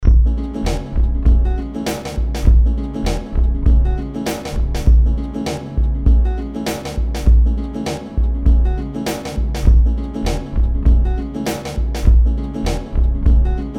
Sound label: C#m100 Loot Groove